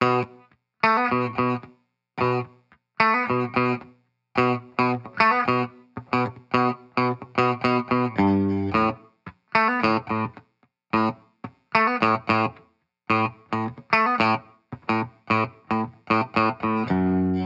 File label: Guitar Bpm110 Key B minor, 70s Guitars; Fox Samples; Back to the 70s Guitars